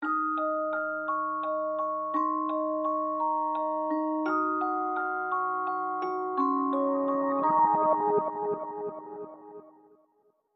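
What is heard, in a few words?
music splash; the unfinished swan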